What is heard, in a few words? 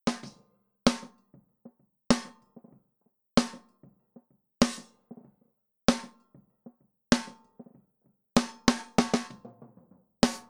Snare With Debleed Ring Through